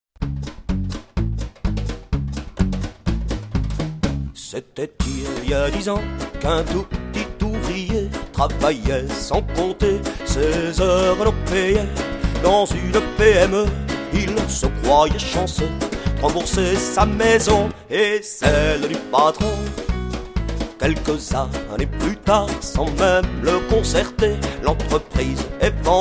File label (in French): chanson; trevidy